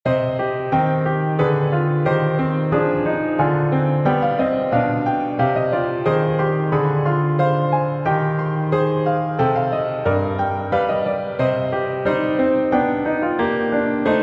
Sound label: Canon Cancrizans in C minor Piano